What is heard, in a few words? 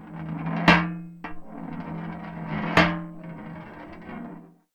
Tin Tray, Marbles, contact mic; musicradar; contact mic madness; Raw